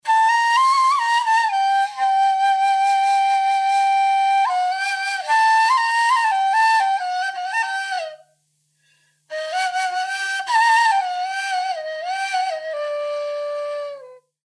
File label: Flute; Mohammed Antar